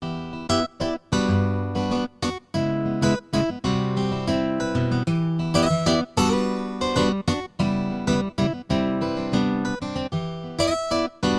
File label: Unconventional Guitar parts only